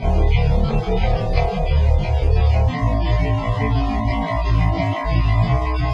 Groove

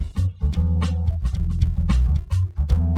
Latino DUET

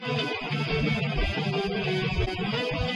Groove

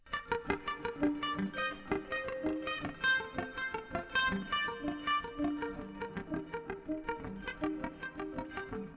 triads

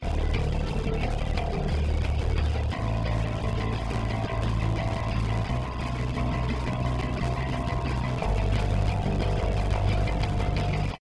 groove soft

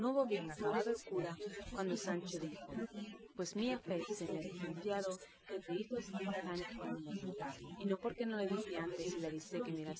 female

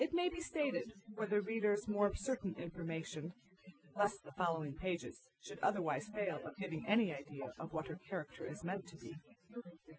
female

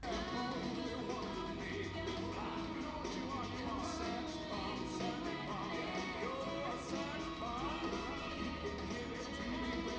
music mix

